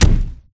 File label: chase footsteps; mommy